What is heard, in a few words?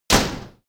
slamming door